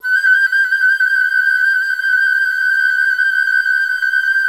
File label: FL TL MJ F#5, Advanced Orchestra; Best Service; Peter Siedlaczek's Advanced Orchestra